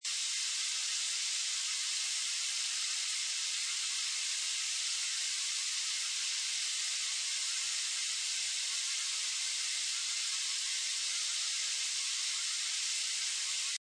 Ambience Jungle Day Loop, Light jungle ambience, thick with insects and frogs. Seamless loop.